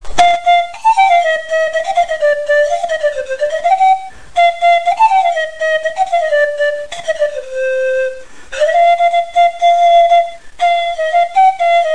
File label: pan